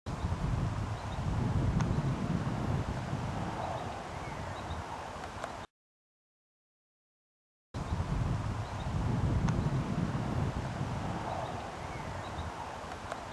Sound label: long call tyne valley